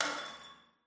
glass break; Half Life Alyx; oneshot

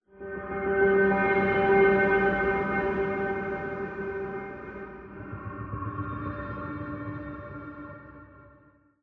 amb prepper rhythmic, ambient; Half Life Model Pack

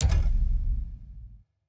mainmenu press inventory; Counter Strike Model Pack; CS:GO; panorama

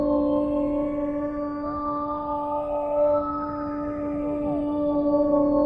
amb abstract high; Half Life Model Pack

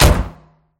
door slam; scripted sequences

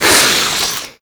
attack hiss, headcrab, creatures; headcrab classic; vox

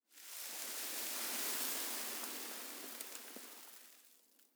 sand gust; ambient; Counter Strike Model Pack; CS:GO; dust2

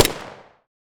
body pistol shot; weapons; Half Life Alyx

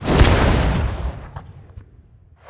missile land; Counter Strike Model Pack; CS:GO; survival

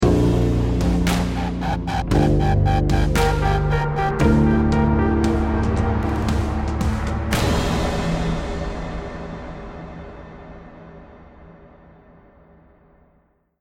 lost round, music

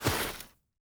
snowball pickup; winter; Counter Strike Model Pack; CS:GO

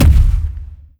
bass heavy, zombie blind step; Half Life Alyx; creatures

